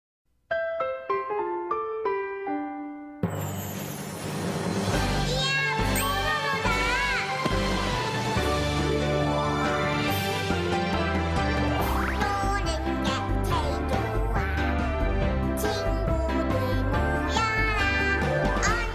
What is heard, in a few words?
opening song; pororo; children